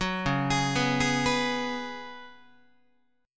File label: Db 7th Suspended 4th Db down Guitar; arpeggiated